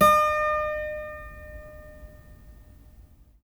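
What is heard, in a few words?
12-string guitar; GUILD 12-STR; Spectrasonics; Hans Zimmer Guitars VOL-1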